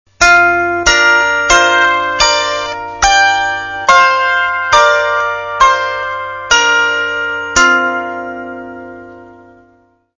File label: harpica